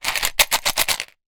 leisure game pieces tiles plastic small in plastic container shake; zapsplat